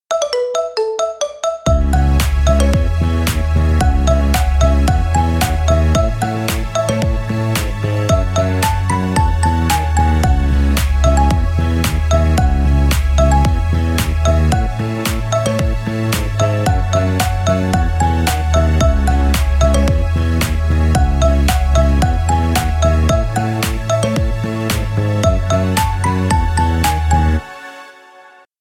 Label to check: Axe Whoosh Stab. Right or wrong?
Wrong